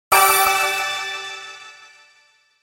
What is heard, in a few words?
Twinkle Shine Burst Shimmer; Sparkle; Blastwave